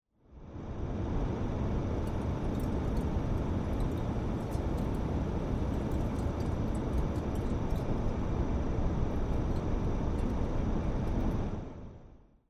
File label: Air Conditioner (Noise Low)